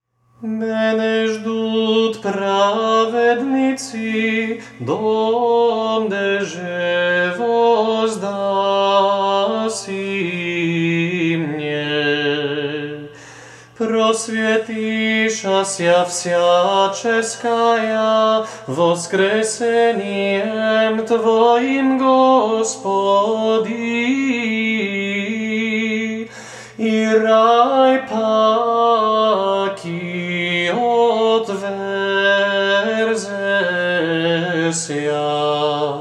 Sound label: Stihir treci glas, Serbian chant